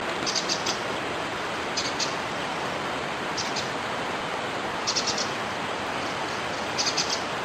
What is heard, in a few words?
Spiderhunter Grey Breasted calls; Amar